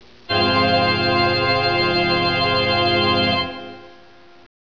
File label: organ